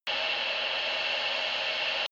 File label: drone spaceship vent